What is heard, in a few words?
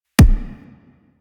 Weapon Punch RPG